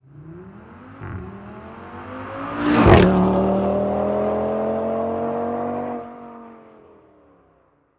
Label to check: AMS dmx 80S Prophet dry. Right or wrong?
Wrong